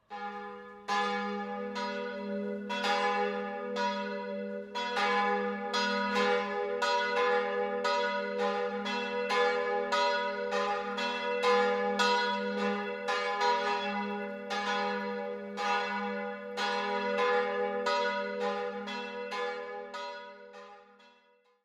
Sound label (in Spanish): CAMPANAS IGLESIA SAN FELIPE APÓSTOL